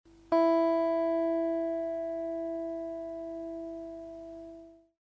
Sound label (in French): gtr e aigu, accordeur, guitare